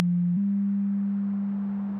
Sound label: Brutal Feedback; Tree Tone Presets; Ableton; Factory Packs; Inspired by Nature by Dillon Bastan